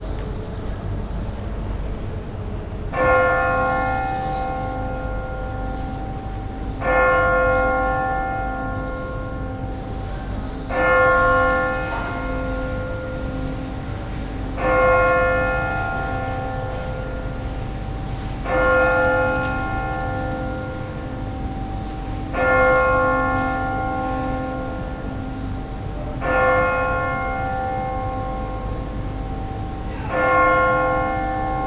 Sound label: 9am Church Bell; St Jan Den Bosch